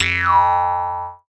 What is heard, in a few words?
Jaw Harp; musicradar; cartoon caper